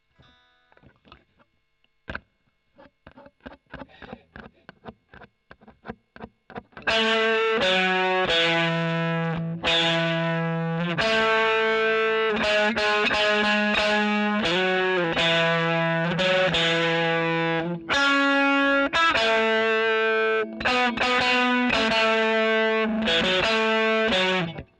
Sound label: E.GTR OD; Gefell